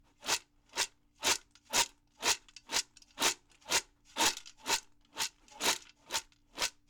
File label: March Of Army